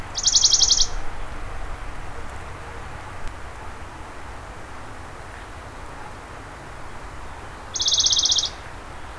Berglaubsaenger; Mittenwald; Michle Peron

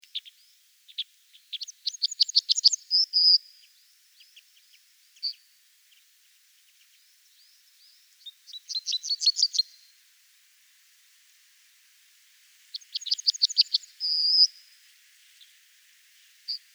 Goldammer; Oberwattenbach LA; Michle Pron

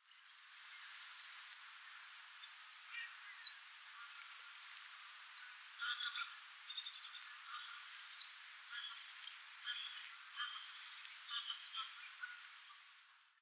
Taigazilpzalp; Altmuehlsee WUG; Andreas Stern

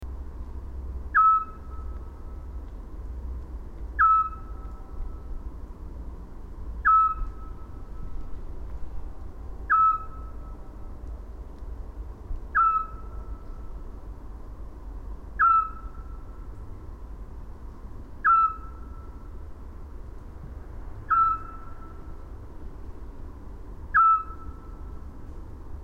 Zwergohreule; Landshut LA; Susanne Rieck

